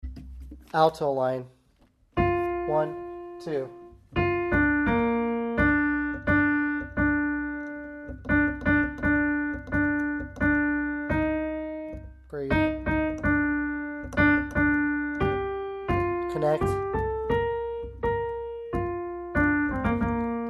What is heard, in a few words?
star spangled banner alto